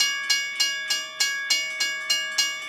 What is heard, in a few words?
railroad crossing